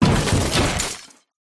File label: impacts junk hard crash metal wood glass